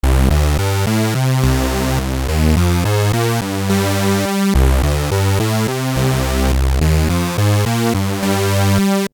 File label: saw mod